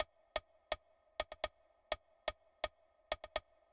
grasskirt

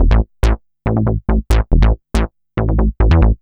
bassline, dance

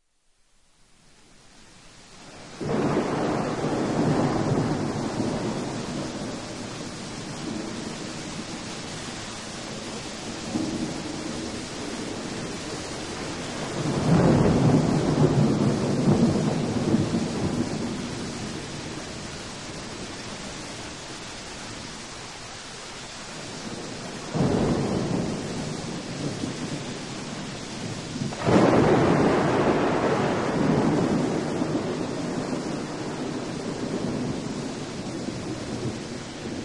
Torrential Thunderstorm; RAIN, THUNDERSTORMS; Sleep Sounds of Nature

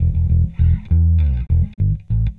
Classic Rock Bass

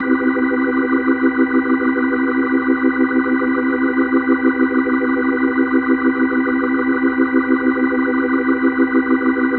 Daddy-O Organ C Maj7, Organ Licks

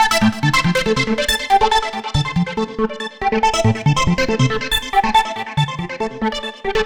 Synth, Synths Lead; Dance

